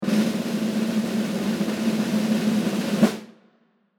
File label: Drum Roll; musical summer camp